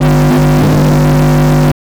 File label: Distort